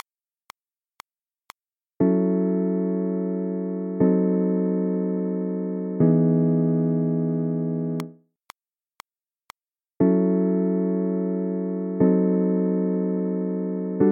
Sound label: dim7 substitution, guitar